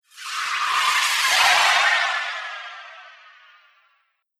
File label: Teleport Traction, sci fi; Science Fiction Sound Effect; Andrew Fleming; Twistedtracks